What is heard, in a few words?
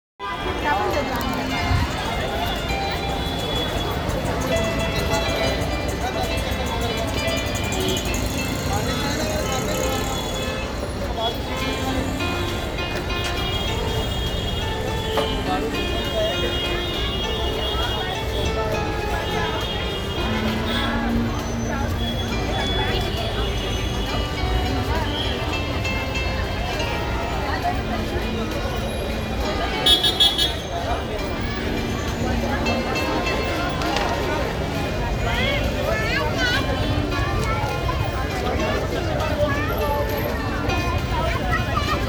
crowd noise, diwali